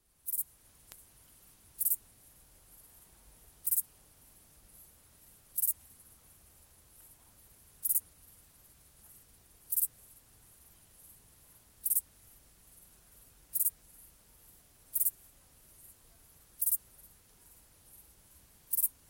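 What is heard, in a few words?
grasshopper sounds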